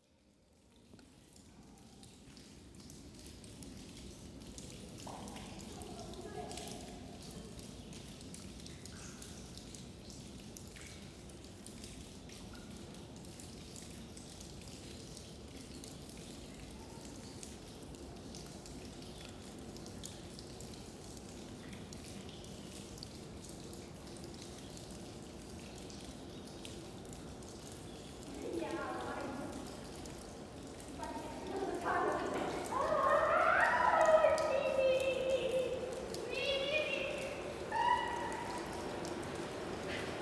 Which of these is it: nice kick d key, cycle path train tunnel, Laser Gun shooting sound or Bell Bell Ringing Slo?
cycle path train tunnel